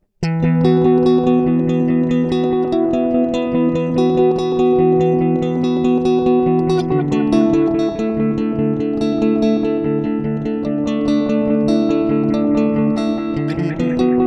DM 2w Custom Mode

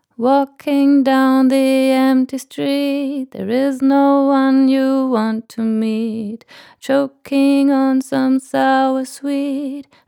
Female Vocal; Tegeler Audio Vocal Leveler 500; ohne VL 500

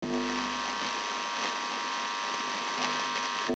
Noise RX 9 Static

